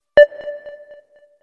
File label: Ping